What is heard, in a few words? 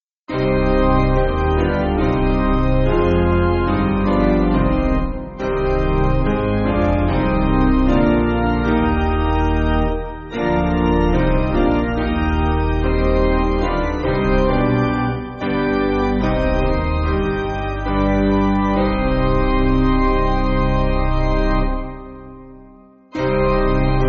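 Piano, Music Backing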